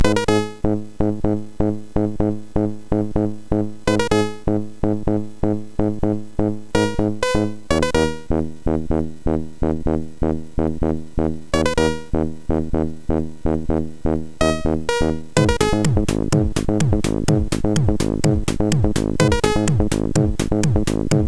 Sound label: Future Breeze,sid MOS6581R4